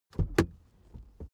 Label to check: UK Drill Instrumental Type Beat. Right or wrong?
Wrong